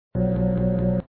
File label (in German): maschine loop; selfstorage